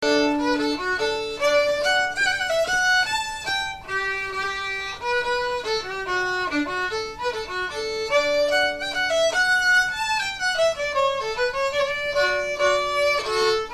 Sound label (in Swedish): schottis